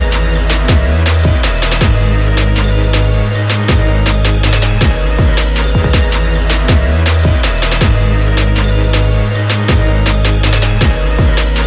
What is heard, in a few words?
Ringer